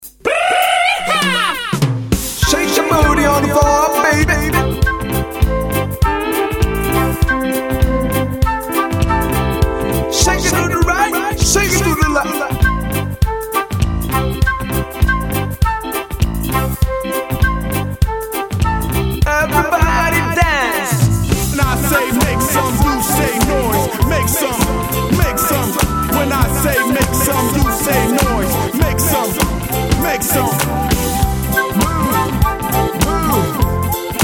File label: Mini Reggae; Move Vol1